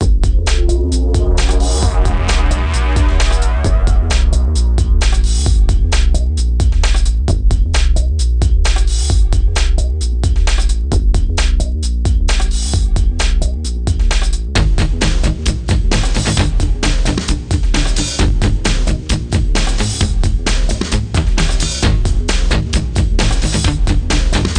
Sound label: Battle; Cosmos Chaos; NDS